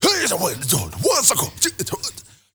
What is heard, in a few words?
MALE RAVE, MALE NOISE, Heart of Africa; Spectrasonics; Heart of Africa 1